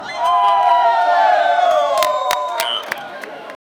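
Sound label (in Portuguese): murmúrio da multidão na cor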